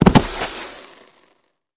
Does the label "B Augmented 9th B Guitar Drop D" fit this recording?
No